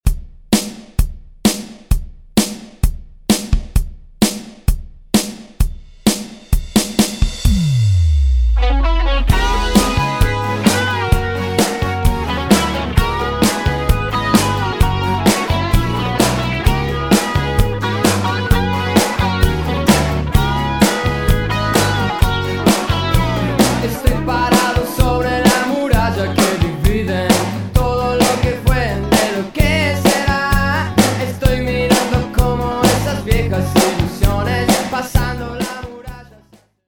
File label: intro outro 130bpm